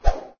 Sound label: Arrow Release